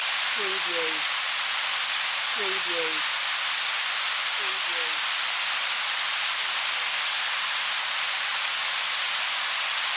Weather Rain Fall On Water Loop

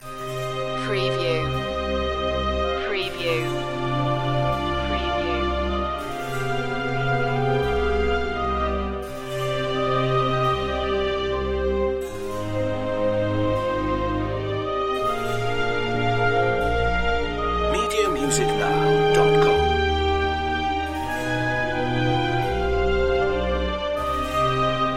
baroque loop

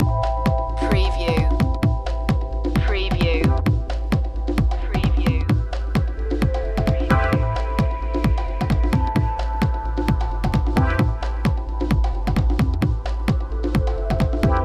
beach life loop

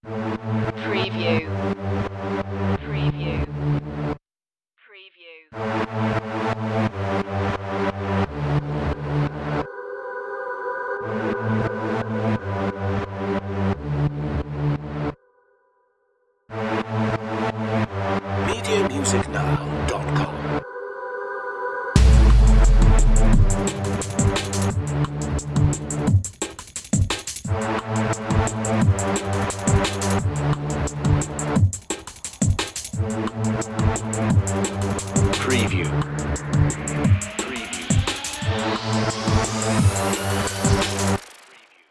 digital siren no vocals

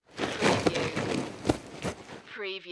Human Crawl Dirt; Sound Effects Bible

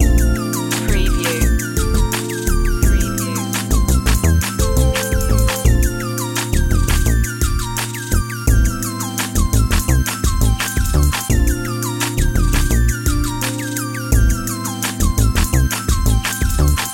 trap miller loop

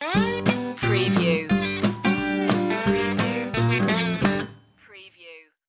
shuffle stinger

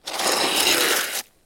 Snow and Ice, Ice or hard snow grate long

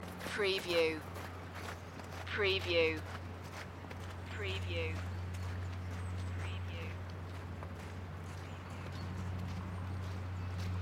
Residential Area Rain Snow Footsteeps Birds

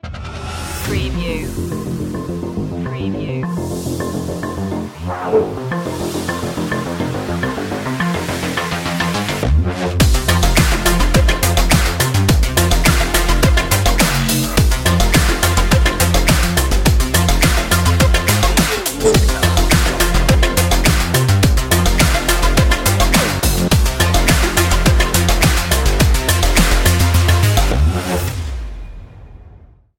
funky

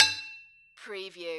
Hit Metal Pipe; lynne publishing

